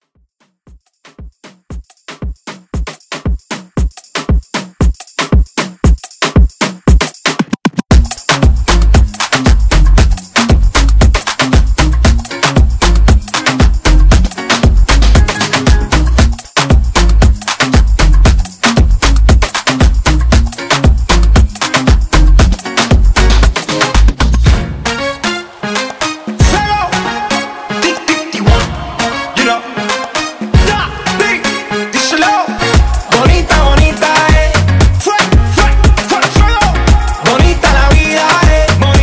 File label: Moombah Redrum